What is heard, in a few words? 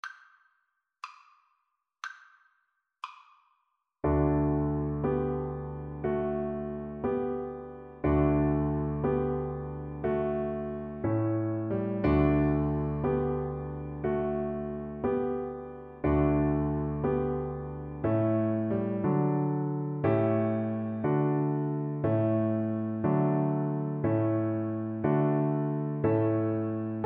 slumber song, viola; dorn